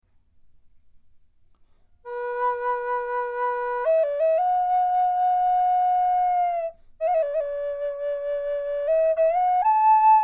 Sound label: Mid B Cedar, flutes